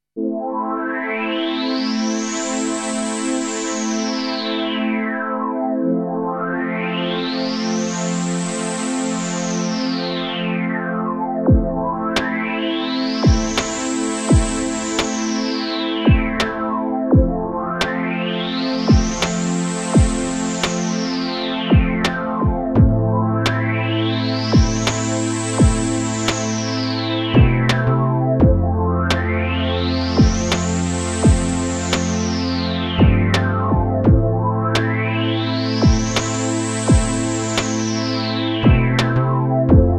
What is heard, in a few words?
sweep pad